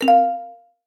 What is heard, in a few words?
new thread